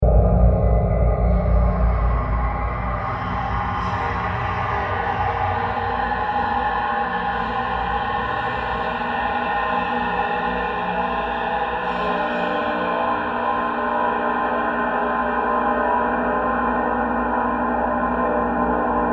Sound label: Horror Ambience, 70bpm; Scary Soundscapes; Crafted Signals